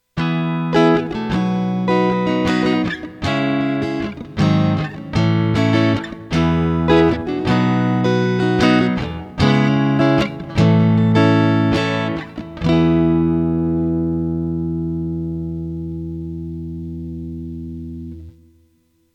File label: guitar; gl legacy tribute; s500; without c3